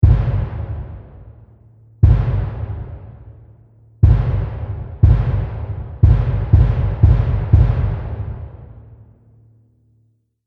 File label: Cinematic Drum Hits, Sonic Logo; War Logo